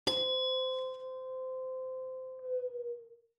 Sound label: ambient